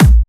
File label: Trance Kicks; Bassdrums; Vengeance - Essential Clubsounds Vol.5